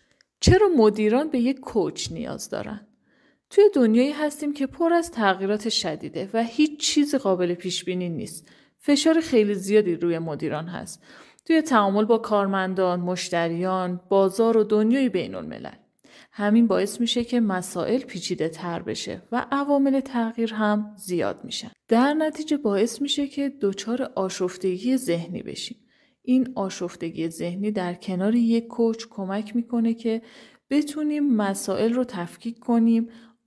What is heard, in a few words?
pad